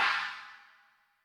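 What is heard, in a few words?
Industrial china; 300 Drum Machines; Yamaha DTXpress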